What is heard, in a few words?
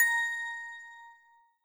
bell